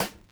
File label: Snare